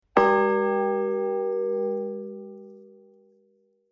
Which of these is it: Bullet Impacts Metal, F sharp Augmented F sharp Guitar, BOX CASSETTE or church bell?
church bell